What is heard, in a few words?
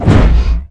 health